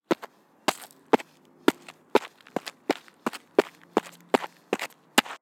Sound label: walking dirt; soundscrate